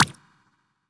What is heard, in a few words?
flip; HeavenStudio; flipper Flop